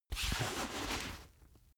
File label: boxing gloves slip in; sport freizeit; boxen